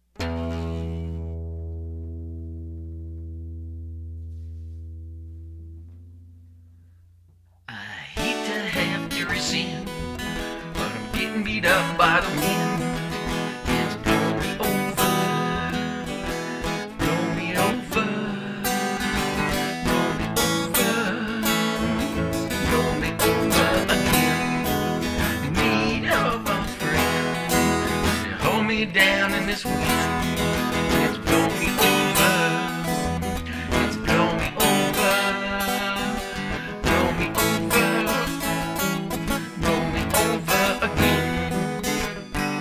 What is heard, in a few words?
Soundcheck